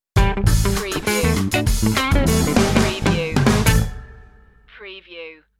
funky stinger

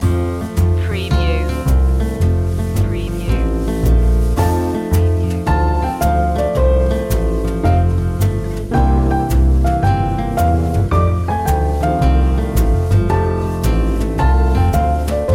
go tell it on a mountain jazz

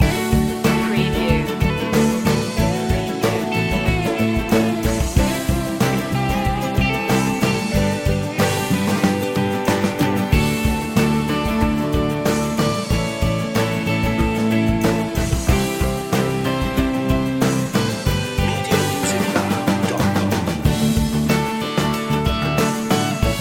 positive days loop

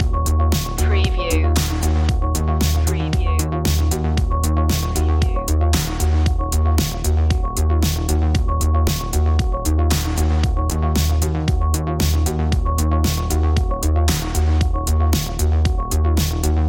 retrowave loop

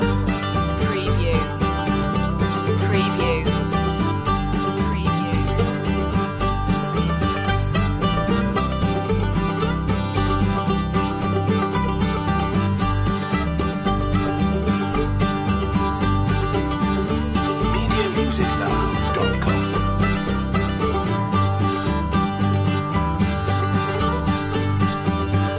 farmyard blues loop

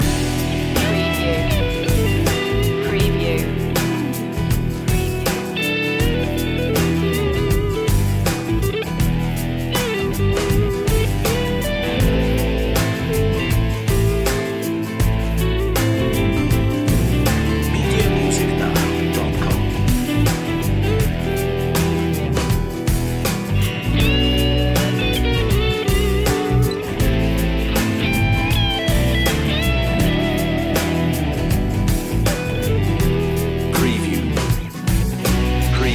smooth blues loop

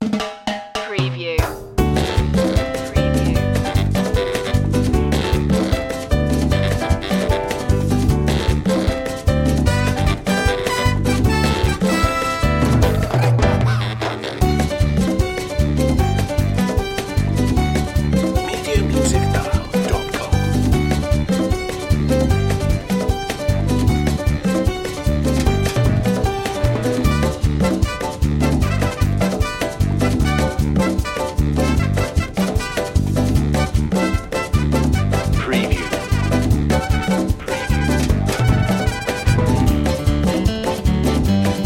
merengue

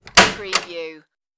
old door open

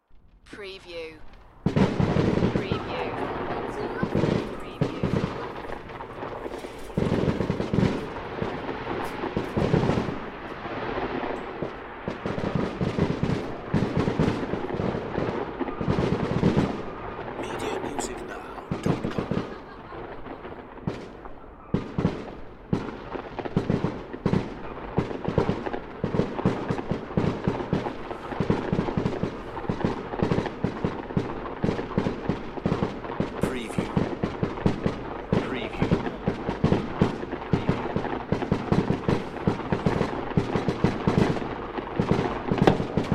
fireworks; beanstalk audio

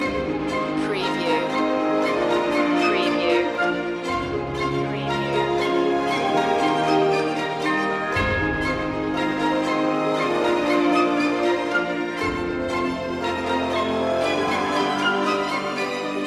eastern harbour loop

